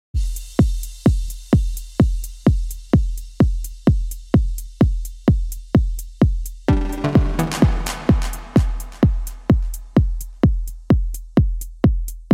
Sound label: TECHNO KICK DRUMS